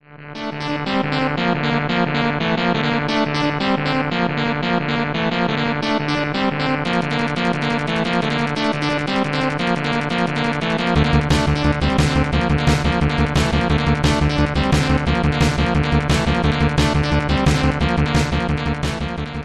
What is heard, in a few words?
level music; t c surf designs; ff3; ff3us